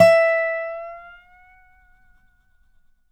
dobro, F#5; DOBRO UP

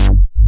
TECHNO; Pro-Rec - Synthsonic; Z1 TECHNO

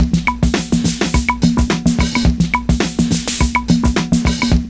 CLAV, loop; Univers Sons

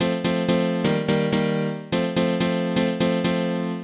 House Piano (125BPM), retro house

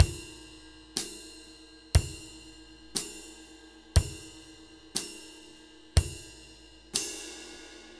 Laid Back New York Loop, Drum; Sonic Foundry (Sony Creative Software); Loops & Samples Drum Tools

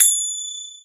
Finger Cym Open, EMU PX-7 Drum Machines

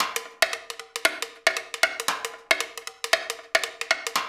percussion loop; InVision Interactive; Percussion Slam; PRIMADONNA

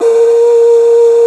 CONCH HIGH, World Percussion

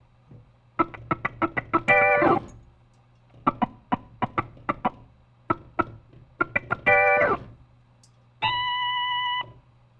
Organ, 70s Analog Funk

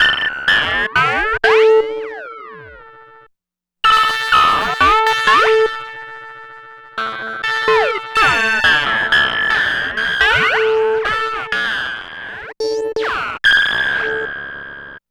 Abstract Synth, Afterhours EDM, Electro Shock; Sonic Foundry (Sony Creative Software)

